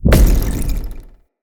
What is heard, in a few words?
Staff Caster Cast Short Shot, Weapons; Player; Staves; Galene